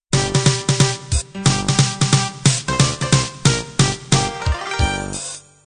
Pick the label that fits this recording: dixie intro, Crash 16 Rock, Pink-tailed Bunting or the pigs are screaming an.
dixie intro